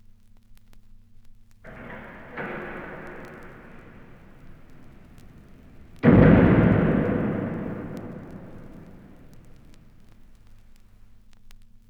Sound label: crypt door closing; horror